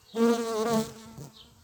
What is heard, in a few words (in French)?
Abeille passe; Vols Bourdn abeilles